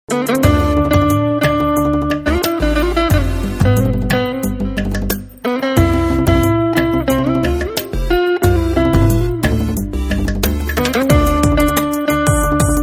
Instrumental Ringtone

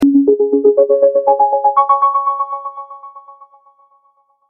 Futuristic Ai Ringtone

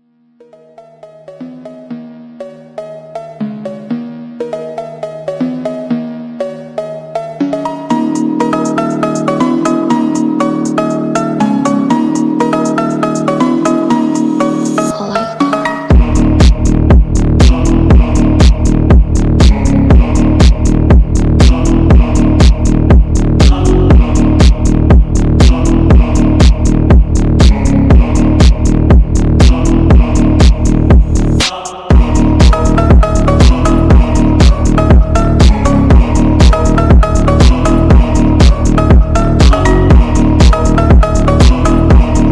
Rave Phonk Ringtone

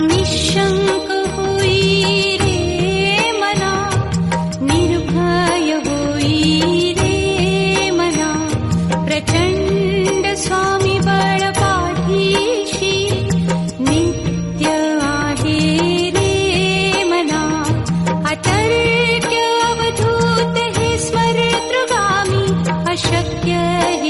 Female Version Ringtone

